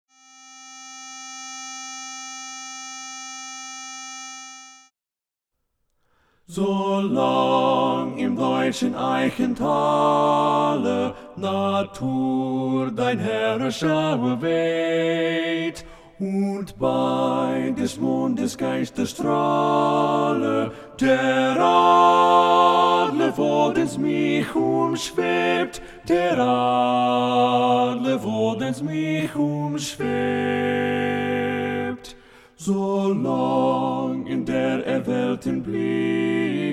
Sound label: Lead Left; Junglingswonne; German